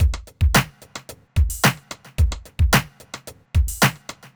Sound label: r n b kit 110